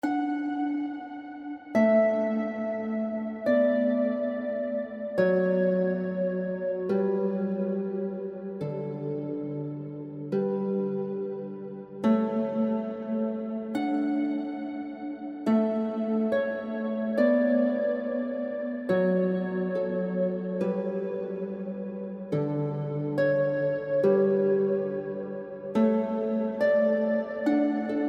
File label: Canon in D Harp; Relaxing Music; heavenly harp music